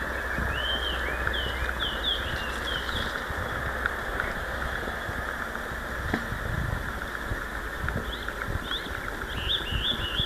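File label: Chinese hwamei; gabicho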